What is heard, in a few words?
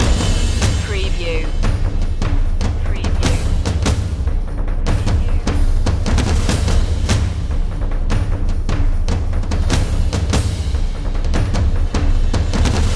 battle drums loop